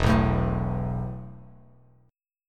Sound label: E Suspended 4th E, down, Bass Standard